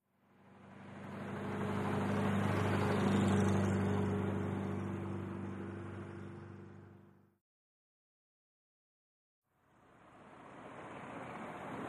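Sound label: Buick, By Medium Speed, close up; 1938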